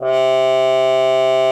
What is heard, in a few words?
CUP MUTE; Xsample VOL-3